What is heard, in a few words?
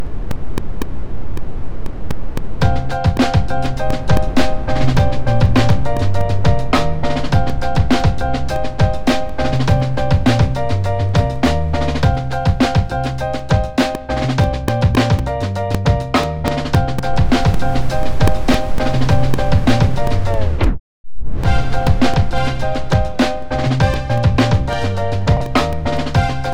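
old school hip-hop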